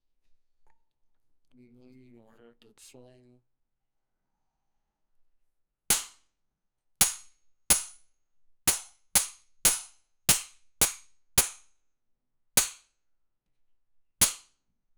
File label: paintbrush broke